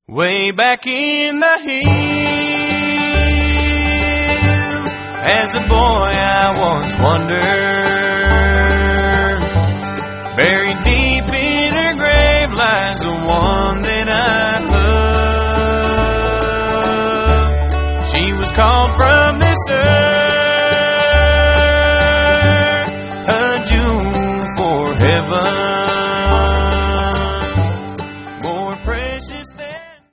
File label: bluegrass